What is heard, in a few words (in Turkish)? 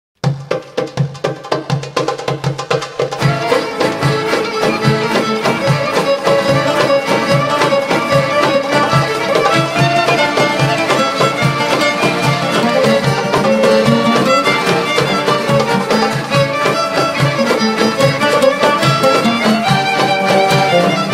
Kibris oyun havalari